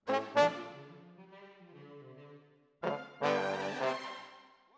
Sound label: Trombones, jazz for piano